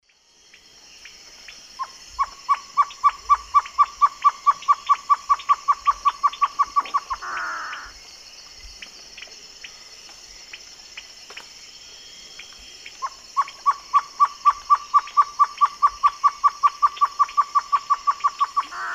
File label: Taraba major, song; S. America; Eastern Lowlands